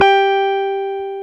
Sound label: Jazz Guitar; 90's Sample CDs; Club-50 - Foundations Roland; Jaz-Kessel